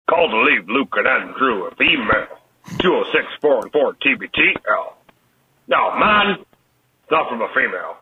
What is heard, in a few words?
voicemail jingle; tbtl